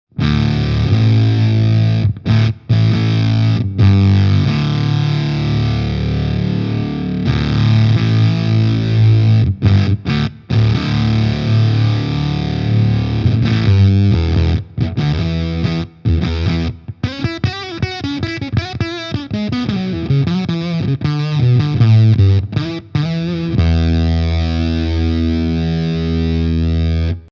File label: JHS Maray K Freq. JHS Mode aus